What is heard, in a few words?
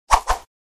Whip swish quick